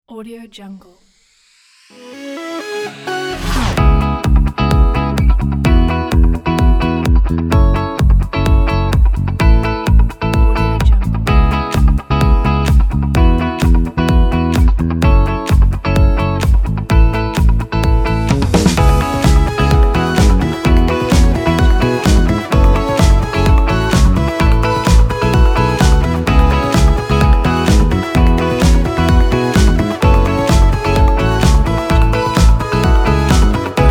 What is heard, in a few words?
upbeat